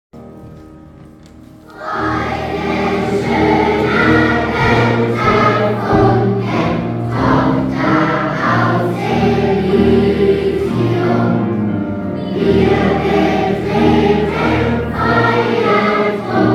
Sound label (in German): Freude schoener Goetterfunken